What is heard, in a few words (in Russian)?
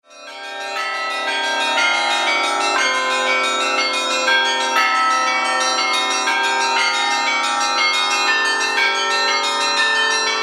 zvon